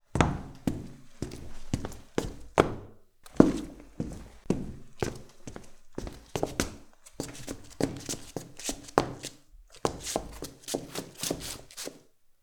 Schritte Holzboden Innen Langsam Schnell

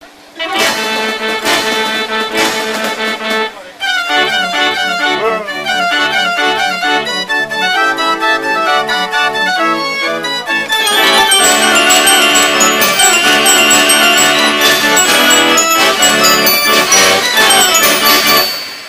Kirmes Orgel; Radetzkimarsch

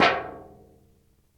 Eimer

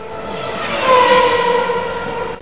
Rennwagen

